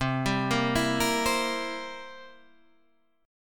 C 7th C down, C7 chord; arpeggiated

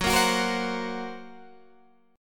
Gb Major Flat 5th, down, Guitar, Standard; strummed; JGuitar

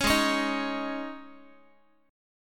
G sharp Suspended 4th Guitar chord, down; strummed

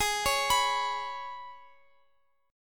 Ab Minor Double Flat 5th down Guitar Open F; arpeggiated; Abmbb5

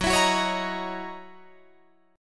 F sharp 13th F sharp Guitar Drop D

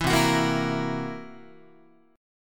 C 7th Flat 5th C down Guitar; C7b5 strummed; JGuitar

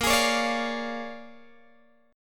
A sharp 7th Sharp 9th, Guitar, Open G

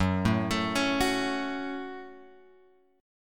F sharp Minor; F#m Chord {2 0 X 2 2 2} arpeggiated

